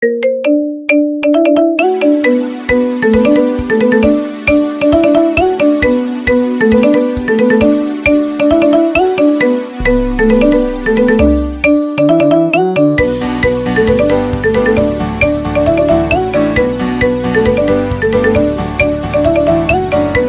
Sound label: marimba